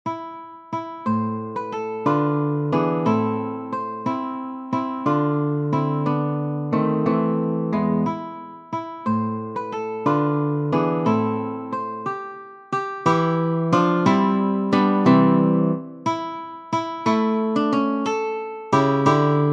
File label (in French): Larghetto ala siciliana, guitare; Ferdinando Carulli; Op. 241 Méthode pour la guitare